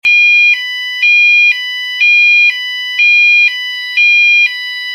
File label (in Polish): Ton